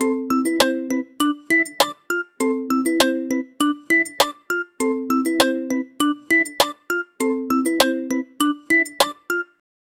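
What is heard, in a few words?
cheerful fruity puzzle background, plucks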